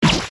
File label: Crashed By Trap Bullet; Delay No More